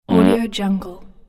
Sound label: fart